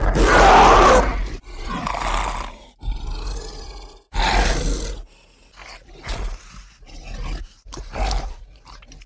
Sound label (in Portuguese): rugido; varcolac; pos ataque